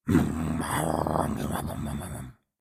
Dino growls; SyrinScape; Syrinscape unplugged; Jungleplanet dinolaser battle

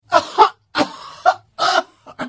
Hit; The Elder Scrolls III - Morrowind

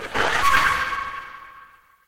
Dragon claw scratch; SyrinScape; Madness in the library

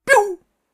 laser guns

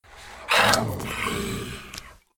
Ghoul Growl; SyrinScape; East Ward

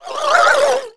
moan; The Elder Scrolls III - Morrowind

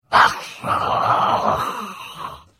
Hit; The Elder Scrolls III - Morrowind

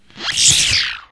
shield FAIL, magic; The Elder Scrolls III - Morrowind; Morrowind GOTY GOG

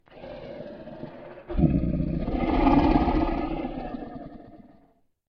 Gold Dragon long breath deep and slow; SyrinScape; Vault of Dragons

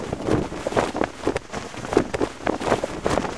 flag; The Elder Scrolls III - Morrowind; Morrowind GOTY GOG

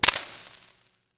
poison apply; Papers Please

